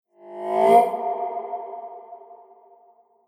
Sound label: Future Vroom, whoosh sound effect